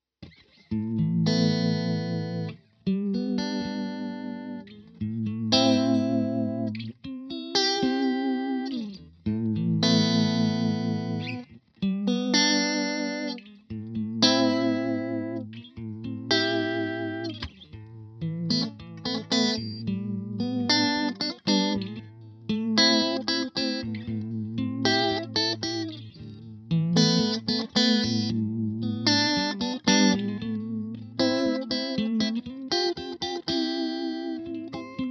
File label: Vibe Full